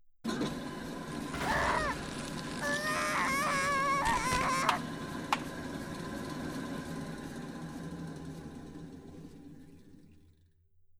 プレス機 全身

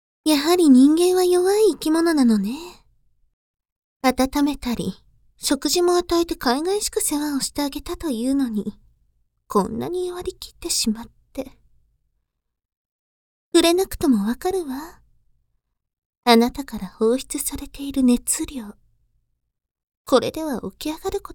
搾精性交編 SEなし

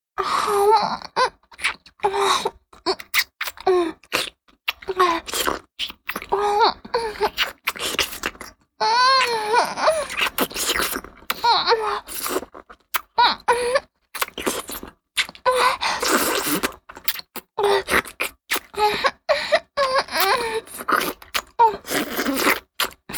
フェラチオ(弱):頭を前後にゆっくり往復して陰茎をしゃぶる, チュパ音; チュパ音抜粋集

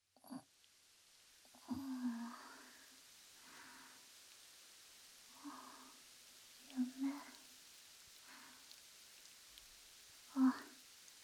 耳ふー連打; 2年後の夜【BGMなし】

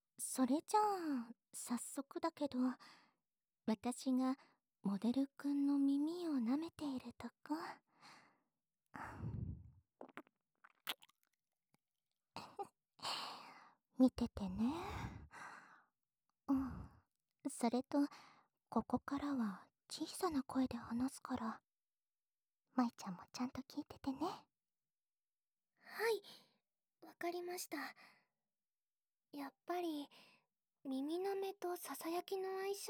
耳舐め基礎練習♪; 先生とモデル君と耳舐め基礎練習♪

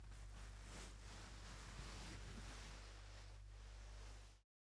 衣擦れ音

